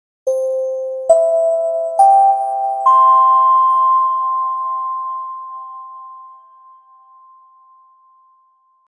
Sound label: start imformation chime